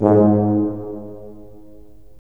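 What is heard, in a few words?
brass; LA Composer vol. 3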